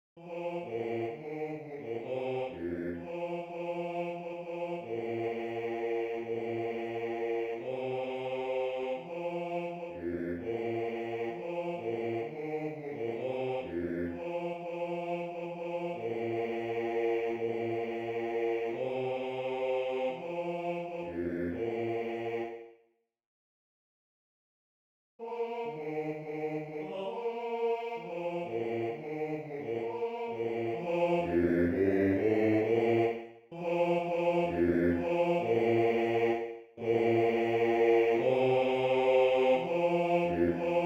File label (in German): Bass II; Der Pudele Luis